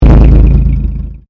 Convolution Pulse

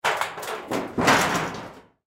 Sheet Metal Up, Foley Sheet Metal Pick Up; Materials; Blastwave

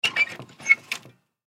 Baby Crib Lower Gate; Household

